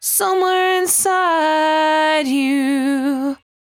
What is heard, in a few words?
74-5bpm B, Velvet Vocal; While U Could; Zero-G